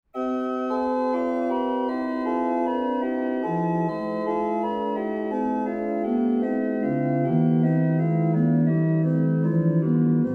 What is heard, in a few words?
invention c major, organist